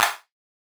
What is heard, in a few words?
clap high